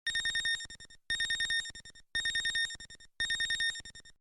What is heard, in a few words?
nes warning; tmnt